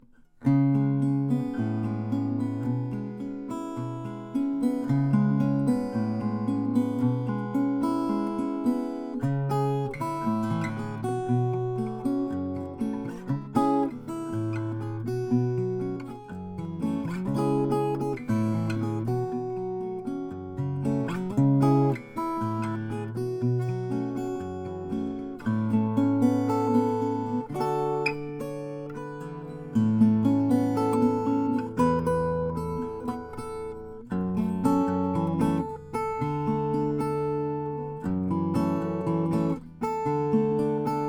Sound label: sheeran w03 indian rosewood